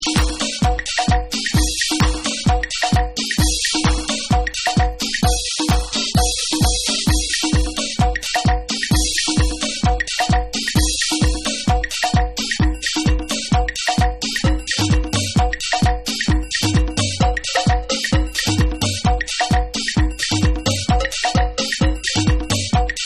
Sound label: Bang O Drum Mix